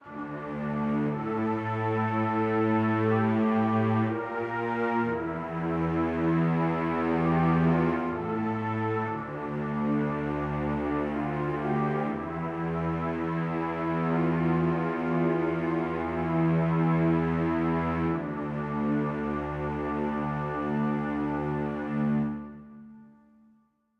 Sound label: Music Debrief Bad Sting